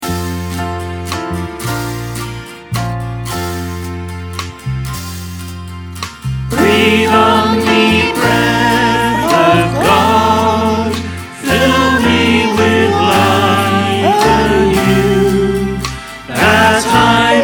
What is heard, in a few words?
choir; Breathe on me breath of God